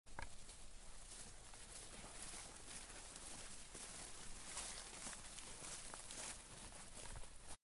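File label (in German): gruppe läuft im kleefeld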